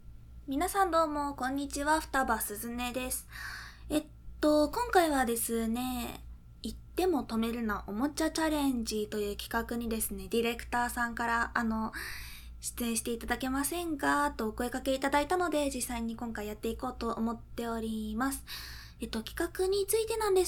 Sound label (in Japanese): おもちゃチャレンジ実演; 「イっても止めるな」; THE FIRST ORGASM